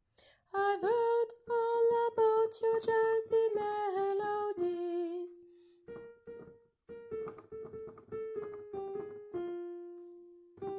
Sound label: alto; de I à fin